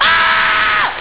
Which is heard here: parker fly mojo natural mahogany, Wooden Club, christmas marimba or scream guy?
scream guy